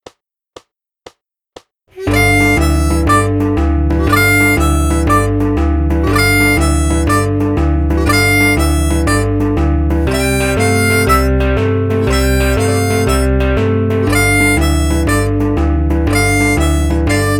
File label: Slidey Blues 120bpm